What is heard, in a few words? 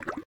running water, river sounds